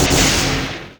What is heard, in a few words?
lightning storm; weapons; dota; leshrac